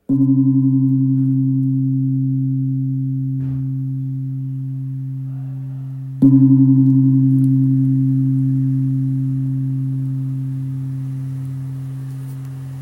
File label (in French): Gong birman Do